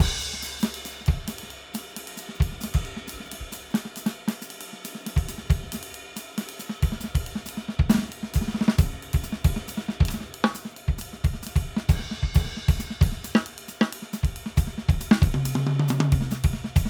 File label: Straight 8th Jazz Improv 136 bpm, drums; Basement drums vol 1